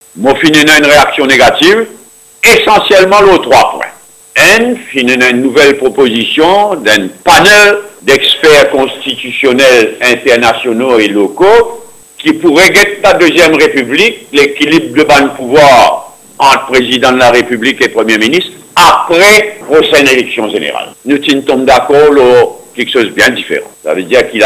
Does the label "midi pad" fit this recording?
Yes